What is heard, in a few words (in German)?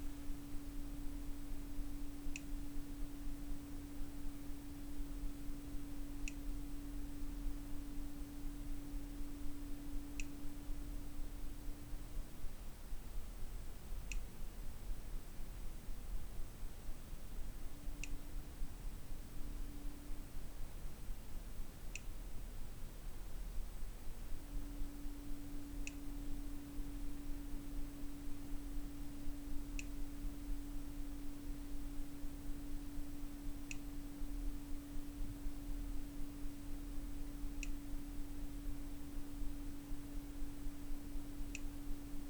Pfeifton im Zimmer